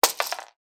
game monopoly game plastic houses and hotels in cloth pouch drop on playing board one fall